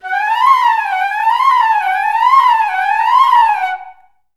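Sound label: flute C4; Best Service; Peter Siedlaczek's Advanced Orchestra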